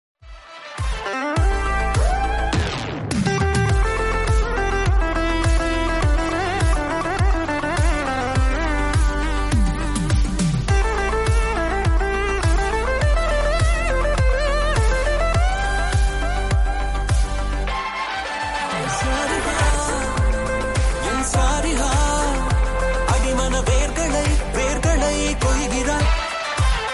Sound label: Instrumental